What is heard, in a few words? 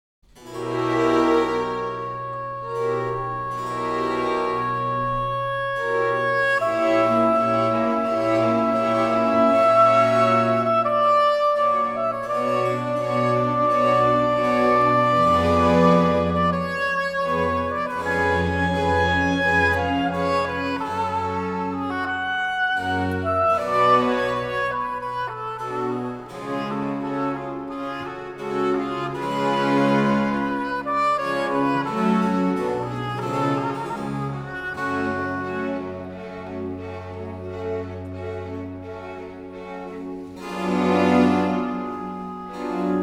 Concerto for Oboe and Strings in C min